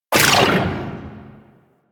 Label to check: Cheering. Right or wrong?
Wrong